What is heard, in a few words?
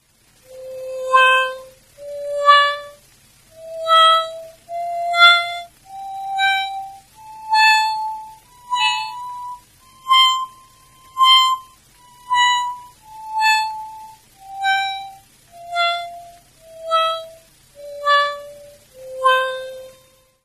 gamma, garmoshki